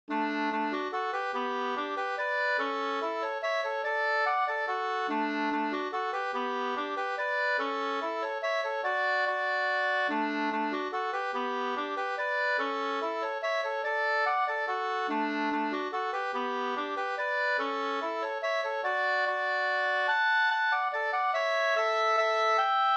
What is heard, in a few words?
mazurka; Knappmazurka Bl 2st